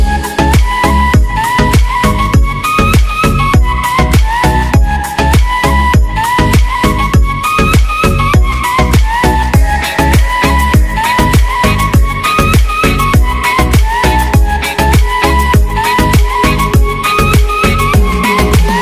Flute

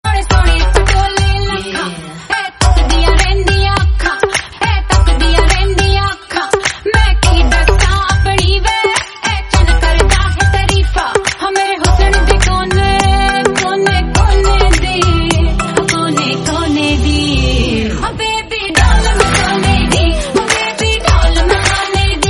instrumental ringtone

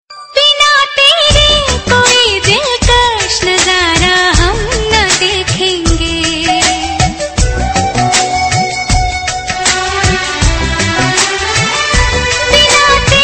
Hindi Cut Song

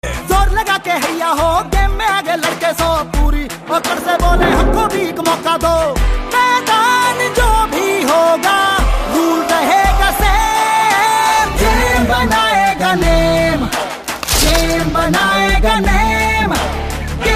vivo ipl ringtone

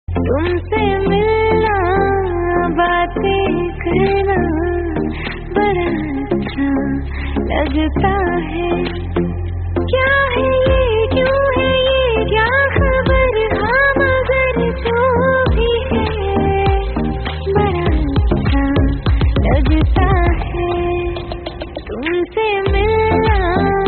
Bhojpuri Love

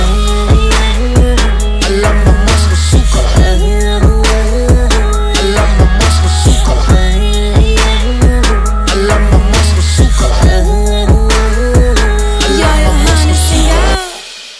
Instrumental

